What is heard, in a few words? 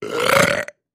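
Large Chunky Belch; belching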